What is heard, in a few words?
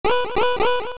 Heart Bounce; A small heart bouncing